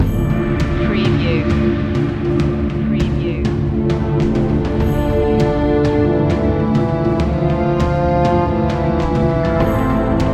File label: ambient army loop